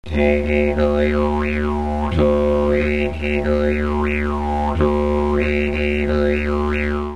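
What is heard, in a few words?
aeiou, didgeridoo; Rhythmic Playing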